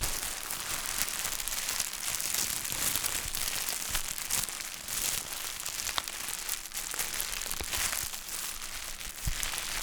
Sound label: Handling A Plastic Bag